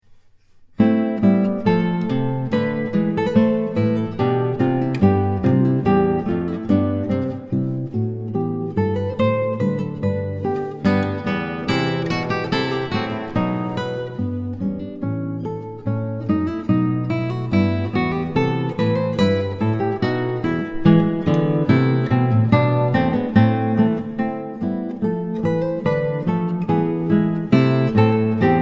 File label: Gavotte, Trios; Handel Alcina